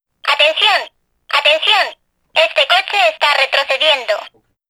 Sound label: backup alarms; ba-80; stereo